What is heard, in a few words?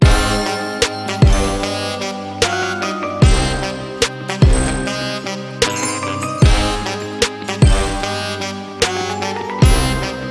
bgm; event scream 2022